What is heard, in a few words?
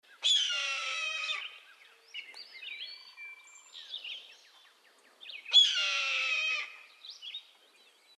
Red Tailed Call; Raptors